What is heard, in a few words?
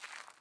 footstep